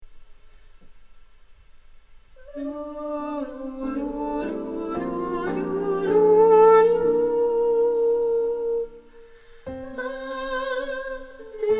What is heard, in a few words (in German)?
Sopran, Singalongs